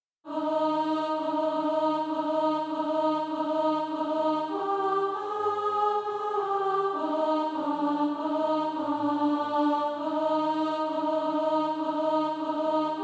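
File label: alto, SATB Practise Music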